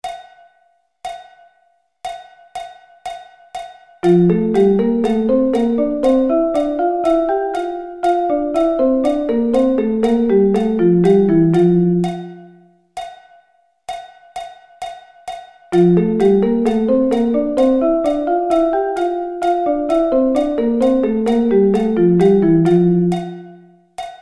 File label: F Thirds